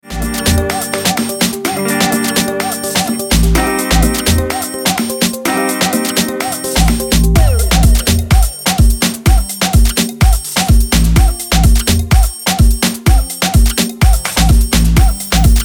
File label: HOUSE